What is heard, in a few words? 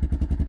generator; Zomboid 41.78.16; Project Zomboid